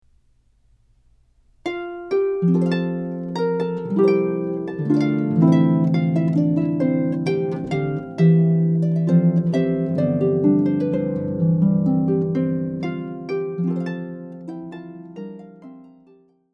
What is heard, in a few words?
Courante; Francisque